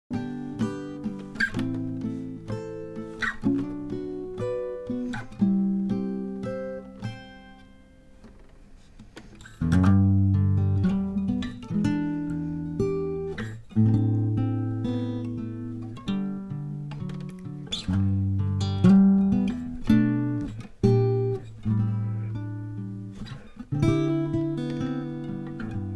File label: Acoustic